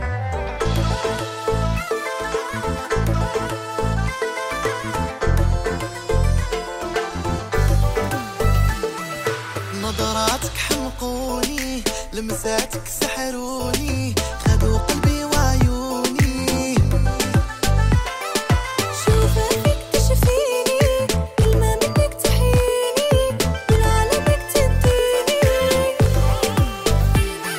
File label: arabic version ringtone; islamic ringtones